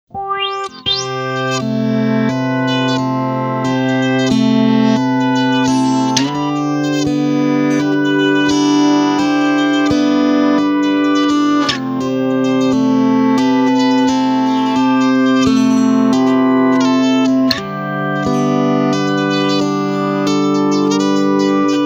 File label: MOOG